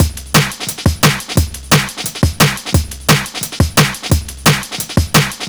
D&B Drum Loop; neuro

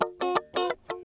CHUCK KUH, FUNK CHOPS, guitar

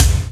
RY30 Kick; Yamaha RY30

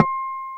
E-GT HI, INST WAVES

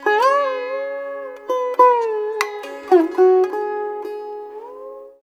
SITAR; Spectrasonics; Heart of Asia

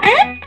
SOLO G, Vintage Blues Guitar; AKAI; USB Soundscan vol.22

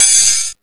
HRD 130 RID, Big Beat Drumloops; USB Soundscan vol.25; AKAI; HARD 130

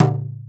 LOG, INDIAN PERC; Big Fish Audio - Didgeridoo and Other Primitive Instruments

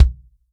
FUNK KIT, REAL DRUMS

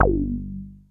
JUPITER 8 G2; Hollywood Edge; Giorgio Moroder Rare Synthesizer Collection

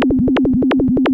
Moog G1; Hollywood Edge; Giorgio Moroder Rare Synthesizer Collection; MLTI.MOOG

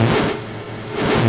SHORT, SHORTNOIS; USB Soundscan vol.14 - Special FX Twisted

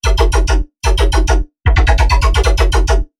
Bearly Tonal Dubstep Bass

